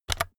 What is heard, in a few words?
keyboard external key press very fast